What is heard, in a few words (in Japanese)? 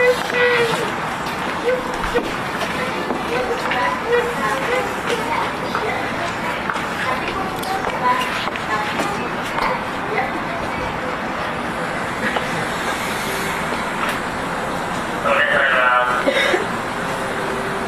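8000系 下高井戸-明大前, 走行音; Keio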